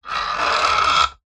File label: industrial lever metal large heavy short fast movement creak; zapsplat